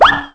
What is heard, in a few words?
jump; cstrike; DICE